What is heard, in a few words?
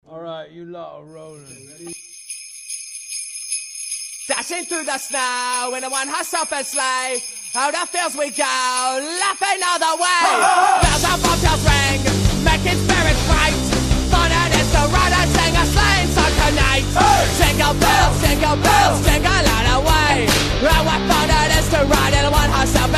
Jingle Bells